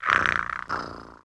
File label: cat idle; pets